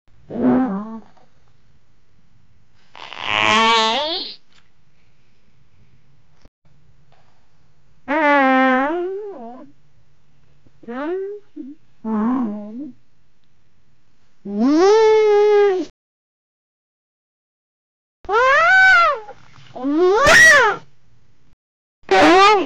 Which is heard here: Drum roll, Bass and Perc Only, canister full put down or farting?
farting